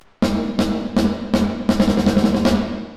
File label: MIX Pan Mod L R LFO Rate 0.72 Hz Correlated; Reverberate3